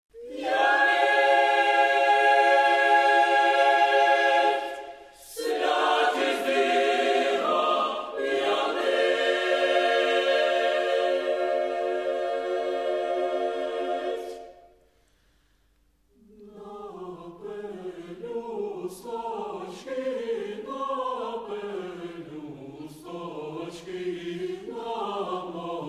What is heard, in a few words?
strum